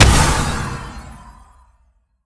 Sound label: impact; smite; olorun